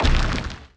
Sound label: asteroid collision metallic small; Factorio